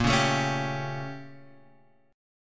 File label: A sharp Minor Major 13th, A sharp, down, Guitar, Open G